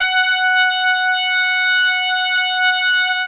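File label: F#3 trumpet straight, brass; Best Service; Brass Super Section; TRP STRGHT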